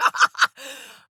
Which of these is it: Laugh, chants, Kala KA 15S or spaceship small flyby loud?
Laugh